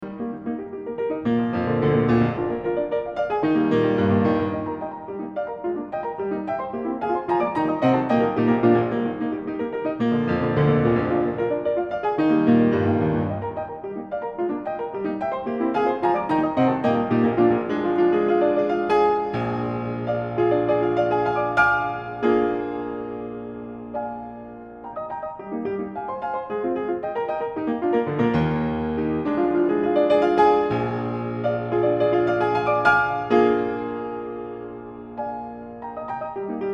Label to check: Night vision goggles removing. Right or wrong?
Wrong